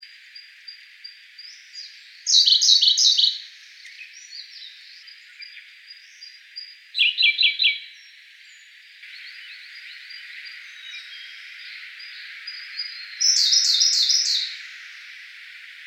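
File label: koruri S, Siberian blue robin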